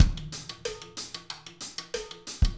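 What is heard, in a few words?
talking drum, drumscores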